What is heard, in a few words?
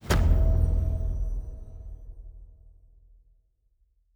Special Click; Fantasy Interface; Fantasy Sounds Bundle